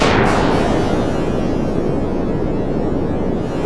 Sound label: THUNDER